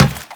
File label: Run Metal, Footsteps; Warframe; Teshin